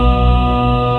un sonido pico como con, choral

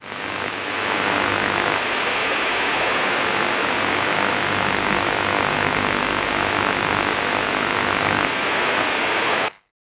efecto de radio antigua, radio tuning

